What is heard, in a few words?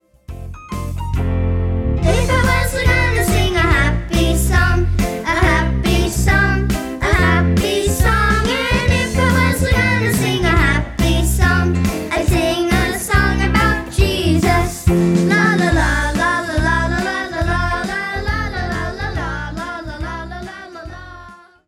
Happy Song